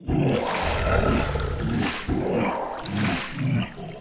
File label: Attack; Z Bolotnik